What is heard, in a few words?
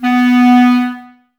Clarinets, Wood Winds; Miroslav Vitous - Woodwind Brass Ensemble; 90's Sample CDs